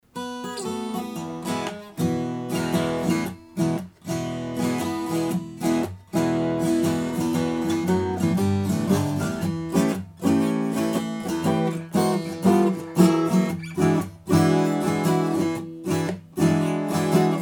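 Phoenix Audio Ascent Two Taylor 12 string STEREO ORTF AB XY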